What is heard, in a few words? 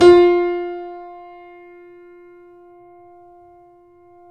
KEY, YC7; Rhythm Section